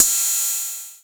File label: HOUSE DRUM CY; AKAI S6000 CD-ROM - Volume 1; DRUM RYTHM